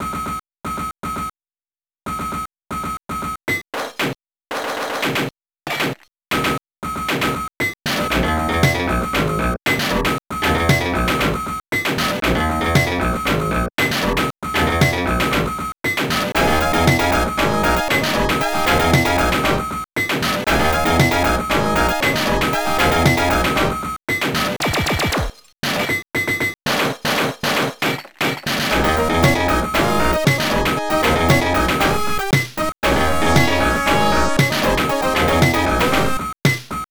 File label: arcade; alien storm